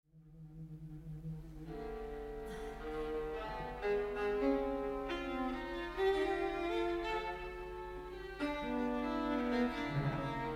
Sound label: String Quartet